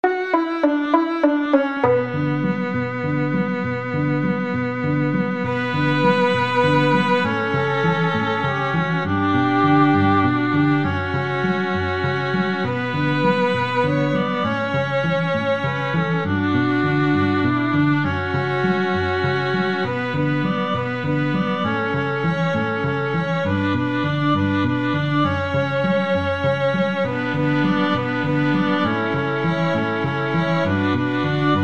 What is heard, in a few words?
spanish violin string quartet